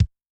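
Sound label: bass drum dry, Kick, Yamaha DTXpress-II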